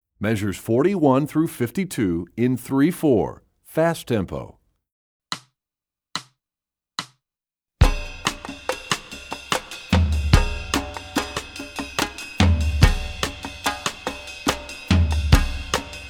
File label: Rhythm Studies, Percussion; EE2000 Book2